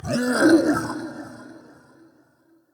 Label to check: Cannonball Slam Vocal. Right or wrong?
Right